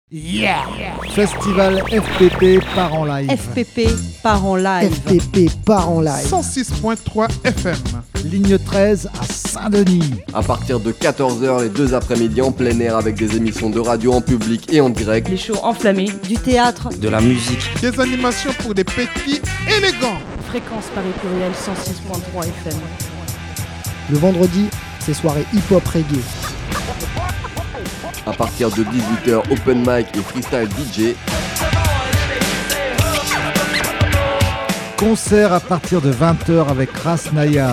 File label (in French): Jingle